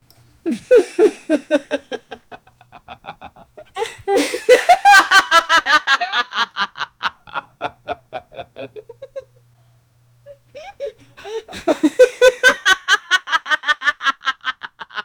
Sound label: 23 year old african, laughing